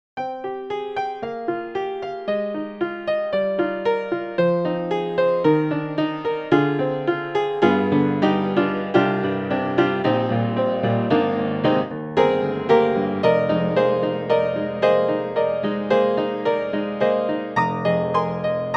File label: Upright Piano Upright Piano; Native Instruments